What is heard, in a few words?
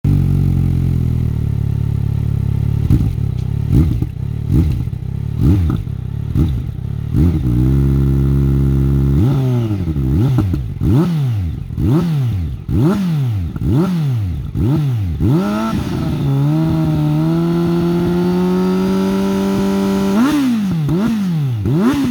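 Z900, Auspuffanlagen; 2020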